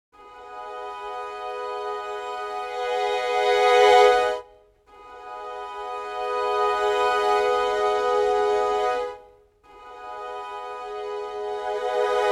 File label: Con Sordino Strings 3 Articulations Dynamics Expression dry; Orchestral Tools Berlin